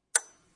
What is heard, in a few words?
bulb flickering